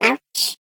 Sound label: spy penguin vo hit wall